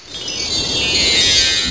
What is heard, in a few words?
SWORD; legend of wolf windows 1997